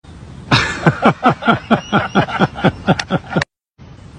rich laugh

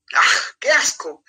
tapir

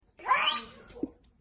cat choke

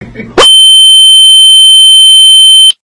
beep; tony stark

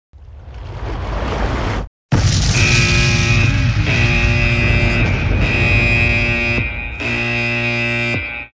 ship hit and sunk

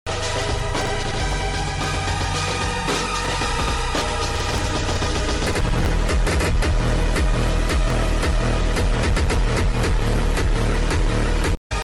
wifi struggles

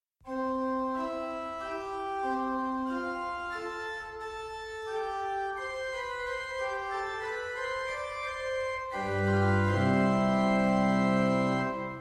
instrumental

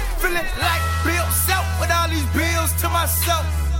rap

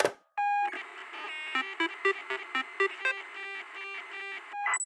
computer rewire

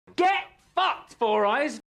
buzz

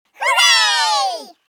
feedback hooray

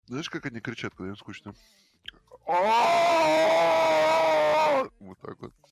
ahahahahah

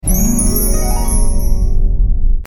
piano sparkles

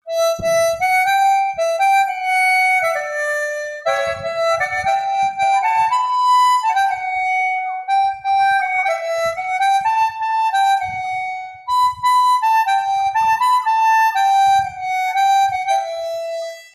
harmonica song sped up; katsumi daidou

